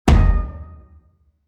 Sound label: scary impact